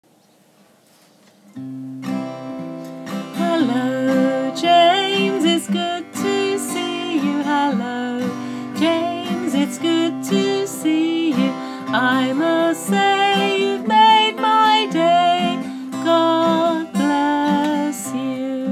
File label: hello song